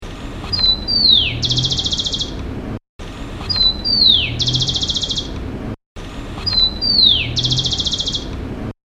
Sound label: sparrow